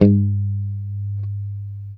A3 sus, Strat guitar; Spectrasonics; Hans Zimmer Guitars VOL-1; JC-STRAT MTE

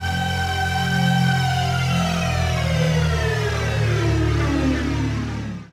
ATMO PAD, Dance Mega Jungle Rave; Best Service

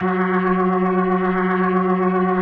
F#3F, B3, Vintage Keyboards

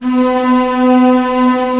STR F#1 FF R, STRNGS, Orchestra; Sound On Sound - Peter Siedleczek's Orchestra

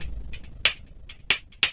percussion loops, 138, Techno

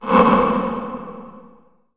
LH R909, REVRB LARGE HALL; TR 909

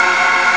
Choir; ST-XX Amiga Soundtracker Sample Packs

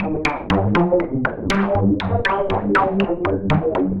Slider Bass, Bass Foundations; Sonic Foundry (Sony Creative Software); The Electronic Music Manuscript Collection

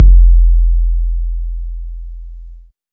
KICK -L, Disco House; USB Soundscan vol.20 - Fresh Disco House I

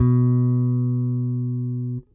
Whole Notes B, Bass; Bass X

